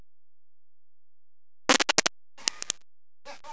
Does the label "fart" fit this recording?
Yes